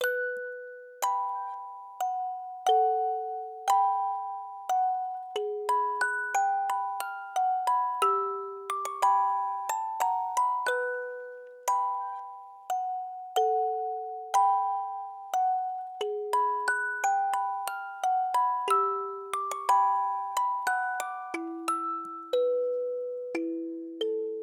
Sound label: Milonga (Music Box)